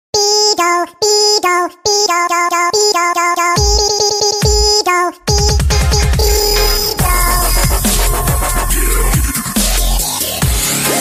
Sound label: funny dub ringtone